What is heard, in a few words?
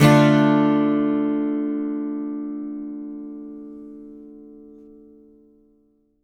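Acoustic guitar D minor down, Country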